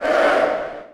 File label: phalanx attack; weapons; dota; mars